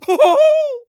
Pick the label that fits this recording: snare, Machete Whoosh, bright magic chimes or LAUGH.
LAUGH